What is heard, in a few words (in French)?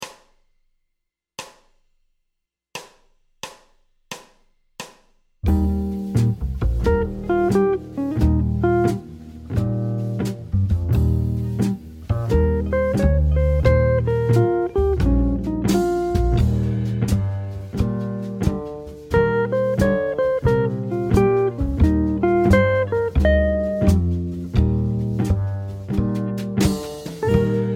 Playback, guitare jazz